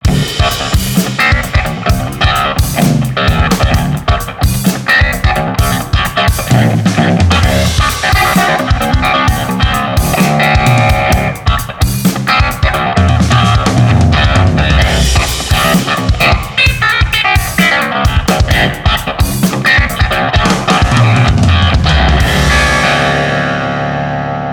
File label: Ring Mod Trem